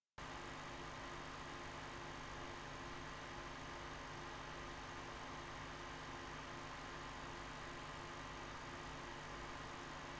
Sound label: HD6990 noise; GTX590review